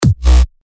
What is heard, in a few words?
BD One Shot; big room